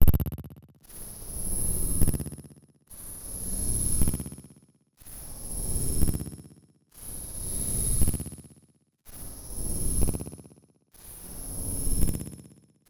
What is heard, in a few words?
FX LOOP 20 BPM